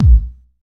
low kick b key, Royality free kick single hit tuned to the B note. Loudest frequency: 101Hz